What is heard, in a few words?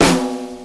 Heavy Snare Hit